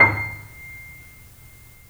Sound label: C, The Piano; InVision Interactive - The Piano; BOESINGSOFT